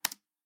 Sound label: Press The Enter Key; LOOPERS